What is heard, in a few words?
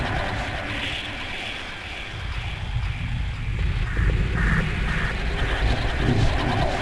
haunted; The Elder Scrolls III Morrowind GOTY; XBOX